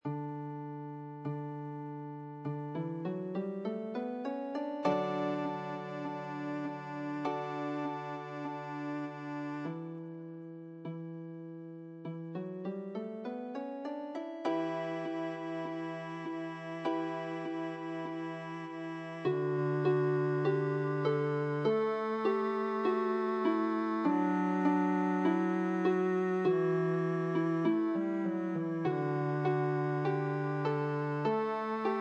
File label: song joy ensemble